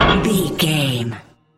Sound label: manhole metal door lid impact